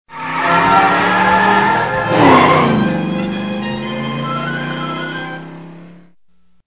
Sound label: Transition